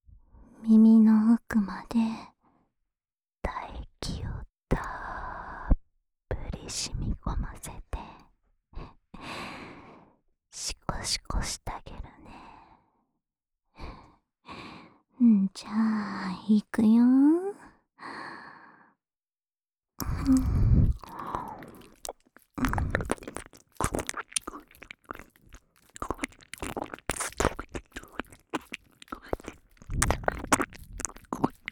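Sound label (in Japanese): 耳舐め手コキ（右）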